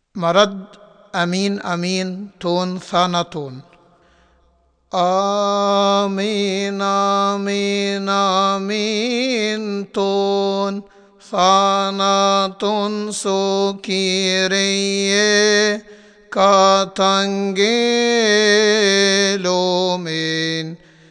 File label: Amen Amen Amen, Coptic; Your death O Lord